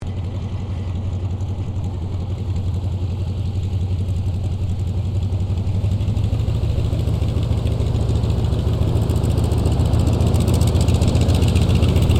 AIRCRAFT CAC WIRRAWAY SHUTDOWN; CA-16 Wirraway; Airplane Sound Effects; Airplanes (Sound Effects:Vehicles)

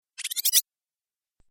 Rising Video Game

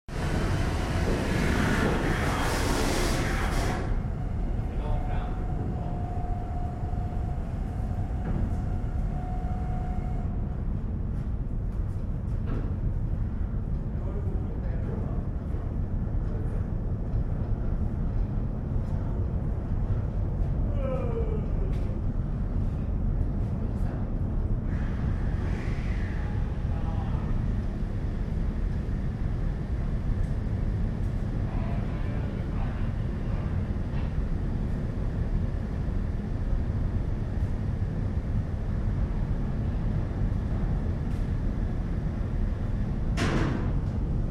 Ship Large Ferry Working Deck Activity; Tom Hutchings

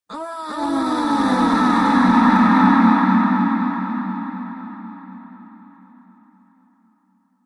Female Space Orgasm, sci fi music

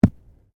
Bodymovement Ground Impact Heavy; Body movement foley to simulate falls and deaths; Wrestling And Struggling

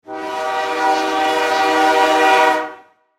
Train Whistle Blow; Transportation; Whistle Sound Effects; Whistles (Sound Effects:Objects); Blastwave

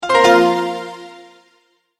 Multimedia Tone-Return/Back

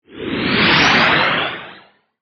Whoosh Warble; Whoosh, Transition, Reverse, Suction, Metallic, Production, Element, Imaging, Accent, Transition; BlastWave

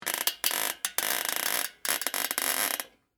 Squeak Metal Springs, Bicycle, Seat; Texture; BlastWave

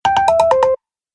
Message Notify Sound, Dong

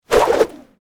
Cable Swoosh, Foley, Cable, Swoosh, Wire, Whip; Whips (Sound Effects:Objects); BlastWave

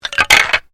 Bones Human Move; Material components for constructing more complex sounds; Tapping; Objects

